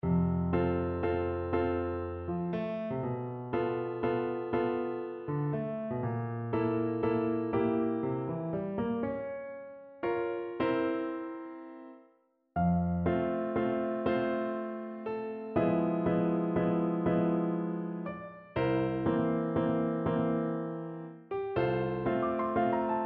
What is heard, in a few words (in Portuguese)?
meditacao, piano